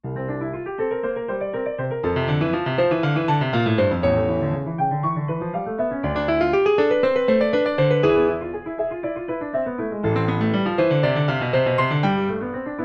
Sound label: invention